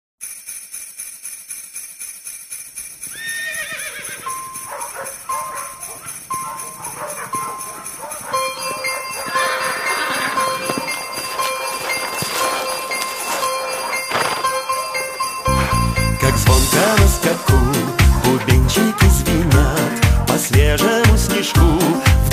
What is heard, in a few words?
jingle bells russian